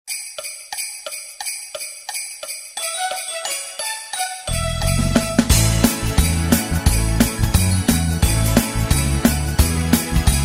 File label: karaoke